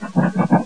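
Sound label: scuttle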